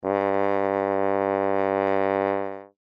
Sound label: French Horn ff G2